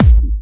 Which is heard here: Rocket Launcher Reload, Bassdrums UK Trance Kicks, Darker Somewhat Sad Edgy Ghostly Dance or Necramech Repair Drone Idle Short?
Bassdrums UK Trance Kicks